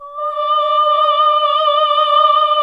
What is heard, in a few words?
OHH D#3, CLASSIC VOX